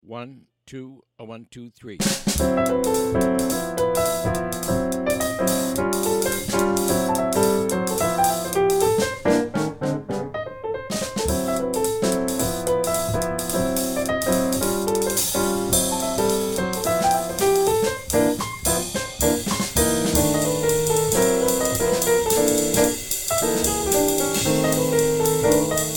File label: minus bass